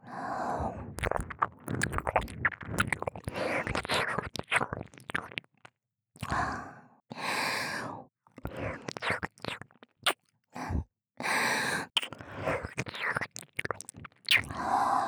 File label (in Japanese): 耳舐め 右ループ; 和胜似男生性格而且懒散的褐色精灵♀不断卿卿我我的交尾日常。; 低音声